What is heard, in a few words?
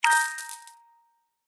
REEL STOPWIN; Lucky Sakura